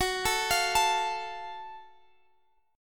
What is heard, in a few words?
F sharp Minor Major 7th F sharp Guitar Standard; x,21,19,22,22,x; arpeggiated; capo=7